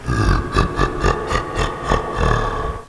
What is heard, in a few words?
boss laugh